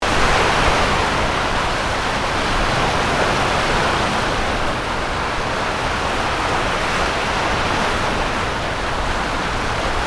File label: Sea